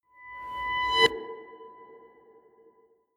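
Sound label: musical swell short reverse, drones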